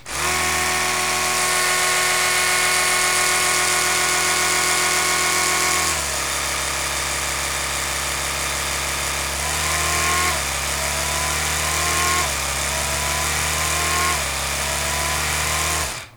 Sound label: electric toothbrush